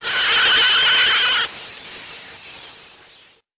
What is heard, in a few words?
Title Transition Data Transmission Terminated; Production Element Title Transition